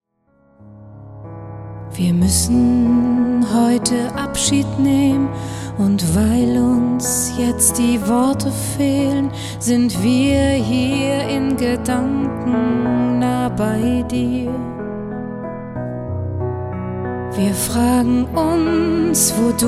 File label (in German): hallelujah piano